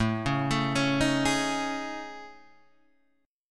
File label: A Major 7th Flat 5th, down Guitar Half Step Down; AM7b5